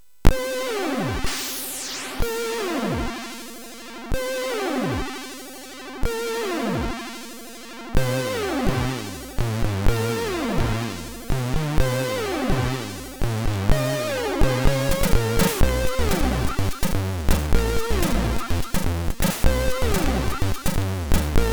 Arpeggio, MOS6581R3

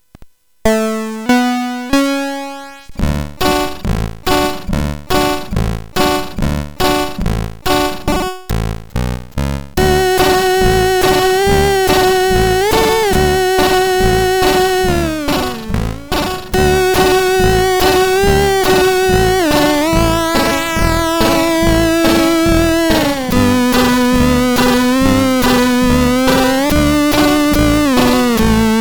sid CSG 8580R5; Trevor Baileys Music Demo

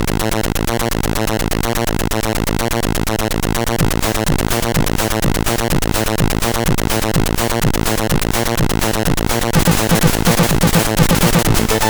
Techno, MOS6581R4

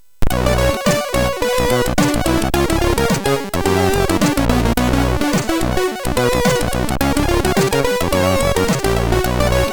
JAZZZZ, MOS6581; MOS6581R3; hvsc

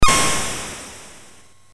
MOS6581R3, GAMES; Who Killed Cock Robi BASIC; HVSC

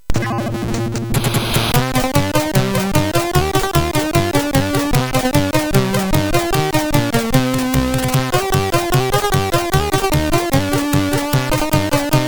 Russian Cow Moolok sid MOS6581R3

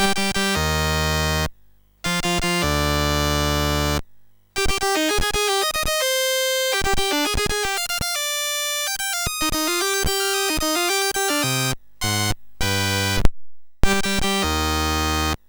Beethoven Sinfonia No 5 BASIC, MOS6581R3

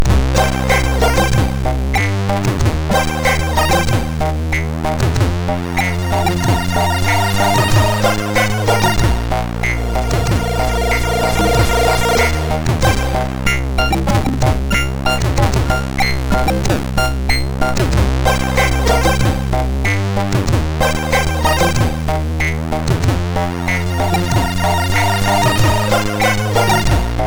Old Music, MOS6581R2

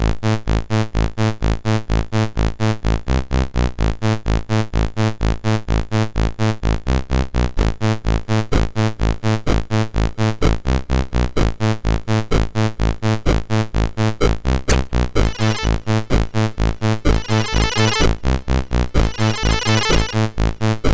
sid MOS6581R2, GAMES; Colorflood

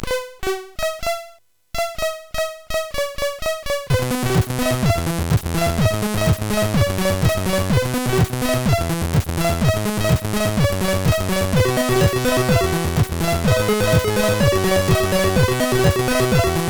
Multihardcore, CSG8580R5

